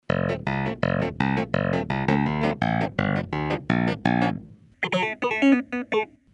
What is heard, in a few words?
clavinet, synth; synthar